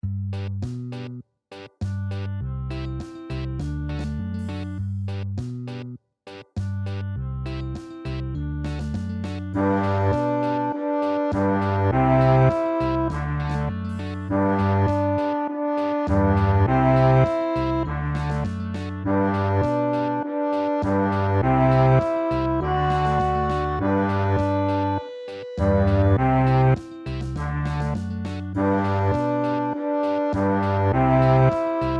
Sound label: reaggy cello